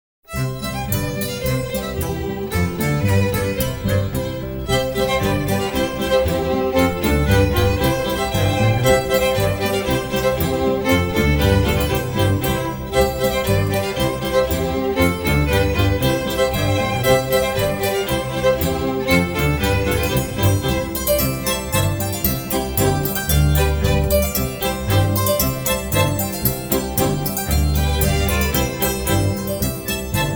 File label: Muenchener Polka; Aus Metzingen